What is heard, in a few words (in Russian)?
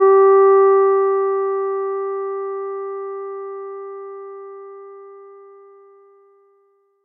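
флейта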